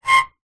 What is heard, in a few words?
foley plastic small item scrape screech metal short